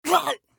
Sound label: scav wound; eft